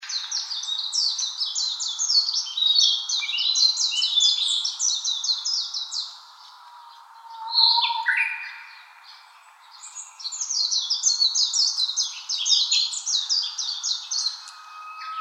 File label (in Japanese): mejiro kibitaki uguisu